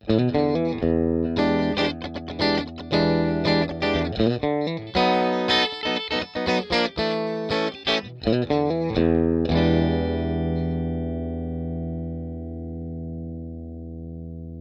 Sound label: Clean G50 Digital, Clean G30 Cable Tone Off; wireless pentru chitara de la Line 6; Line 6 Relay